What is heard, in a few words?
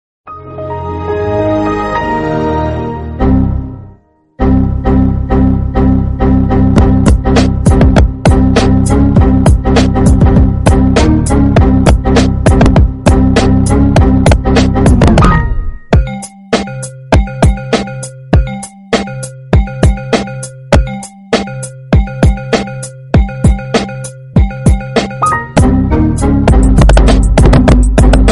windows xp error mix